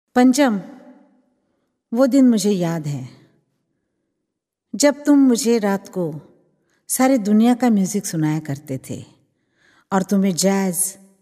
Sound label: Pop Song